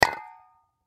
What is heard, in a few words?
Aerosol Can Set Down Impact; Set Downs (Sound Effects:Objects); Foley; Blastwave